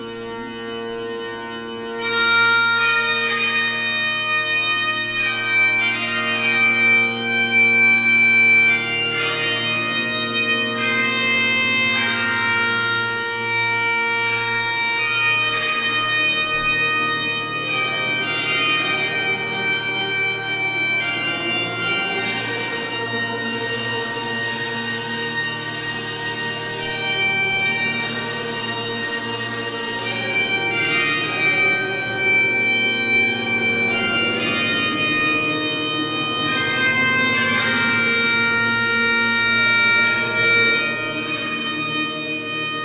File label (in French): musique anamnese